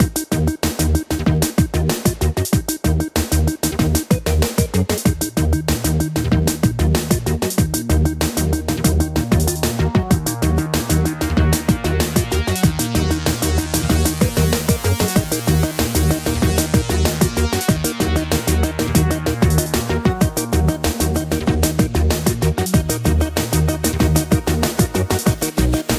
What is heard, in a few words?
song; kaboom